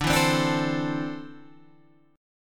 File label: D 7th Suspended 2nd down Guitar Standard; D7sus2 strummed